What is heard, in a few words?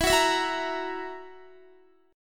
E Suspended 2nd Flat 5th, Guitar; strummed; Esus2b5; x,19,18,21,18,18; 4F,4A,5C,5G,5C,6E